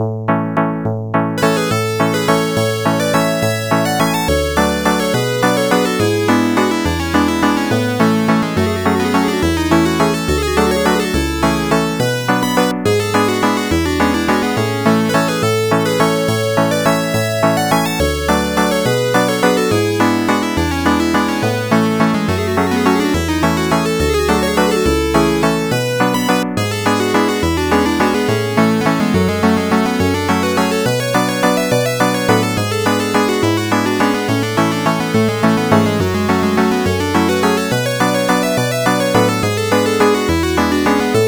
Royal Waltz; Phantasy Star 3; Genesis

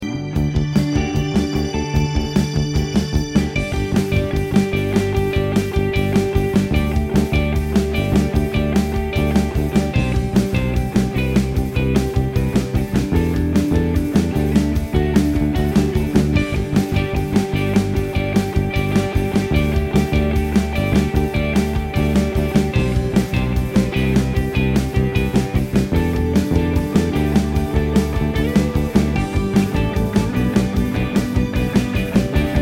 level clear; Cosmic Defenders

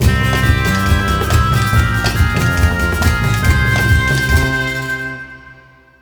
Match Win; Bald Bull

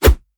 Spear throw

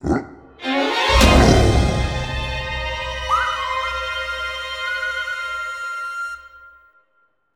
Troll Island Tree Toss; burly men at sea ios macos ps vita ps4 switch windows gamerip 2016

